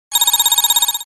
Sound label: Supersonic Ringer